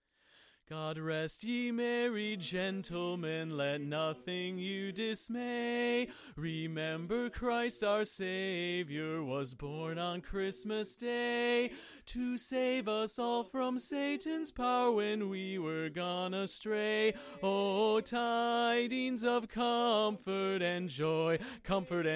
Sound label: God Rest Lead; christmas show